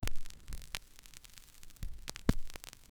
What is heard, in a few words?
vinyl record scratch to h; disruption